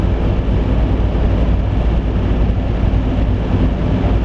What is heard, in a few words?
idle, vehicles; gt500; TDMCarpack; TDMCars